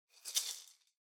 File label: Maracas Noise